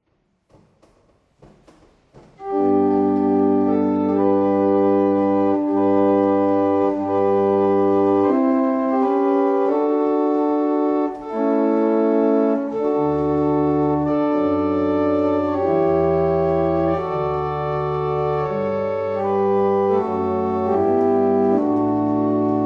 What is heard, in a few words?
Morning Hymn, barrel organ; Letheringsett